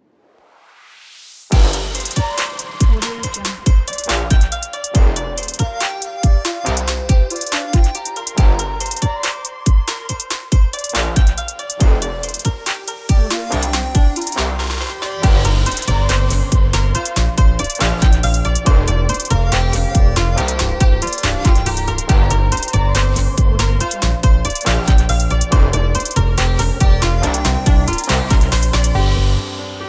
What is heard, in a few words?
chinese catwalk fashion trap; cubart